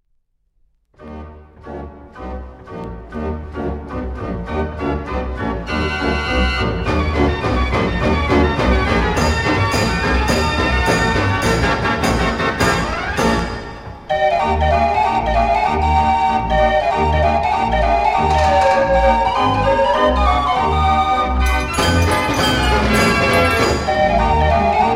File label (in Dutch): draaiorgel; de arabier